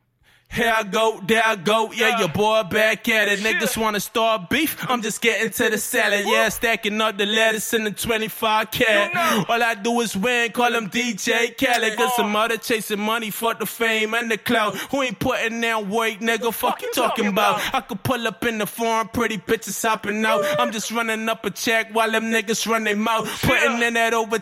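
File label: hip hop 168 BM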